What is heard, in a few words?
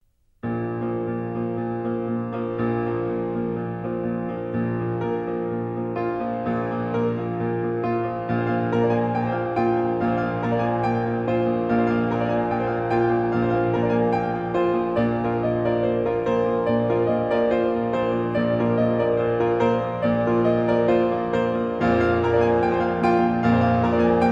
Old Dance Tunes Allegro, Piano; 15 Hungarian Peasant Songs for Piano, Sz. 71 (BB79); Bartók